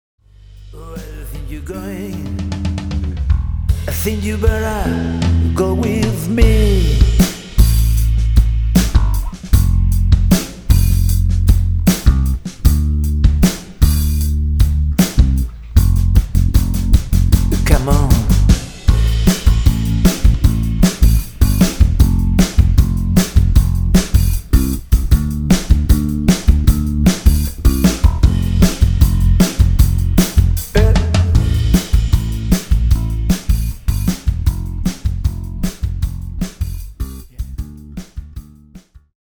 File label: No Guitar